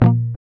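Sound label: GUI A#, acoustic guitar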